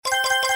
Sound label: Coin Get; One Shot Fishing